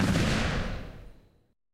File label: 8mm tail close, guns tanks; War Thunder